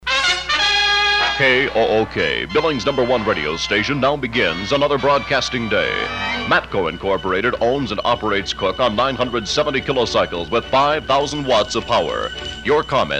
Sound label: sign on jingle; kook 970 billings mt